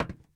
metal box walk; blocks; step

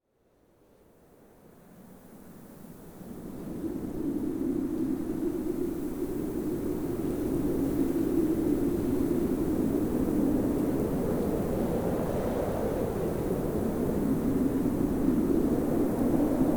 wind normal

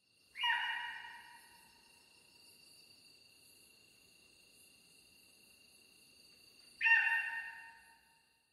deer; ambient

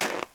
snow walk, step; blocks; Minecraft; Remastered

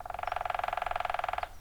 woodpecker; ambient

